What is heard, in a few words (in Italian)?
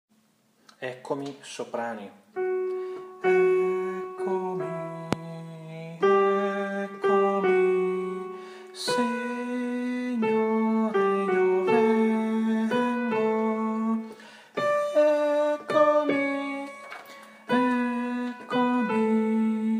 Soprani; Eccomi